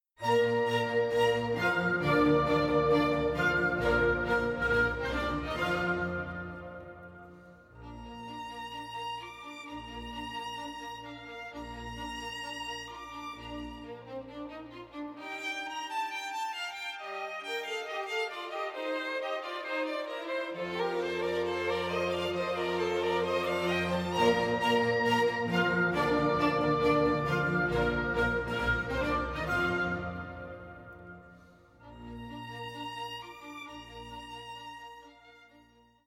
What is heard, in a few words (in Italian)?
Sinfonia in Pastorale allegro spiritoso